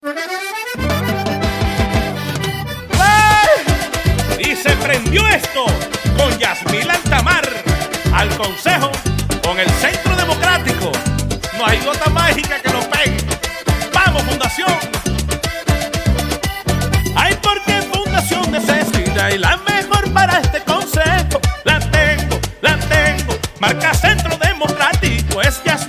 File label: jingle